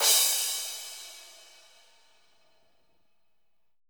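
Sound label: PLAIN crash; R+B KITS2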